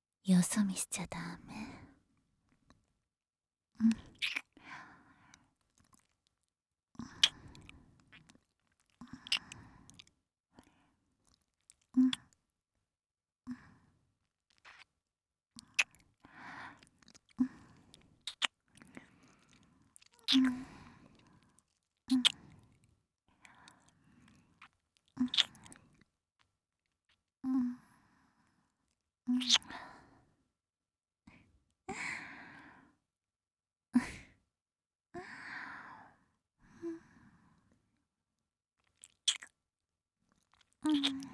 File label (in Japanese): キス; 【マゾハーレム】